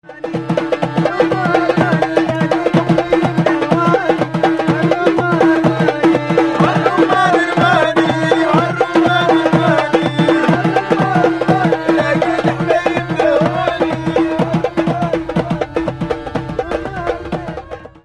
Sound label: twati accordeon percus; libye